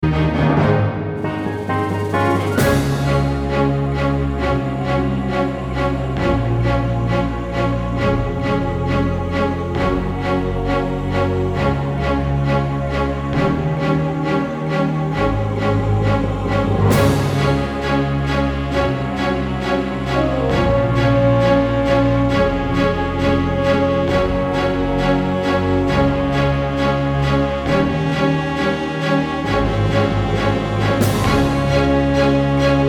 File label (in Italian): orchestra; orchestra scala di milano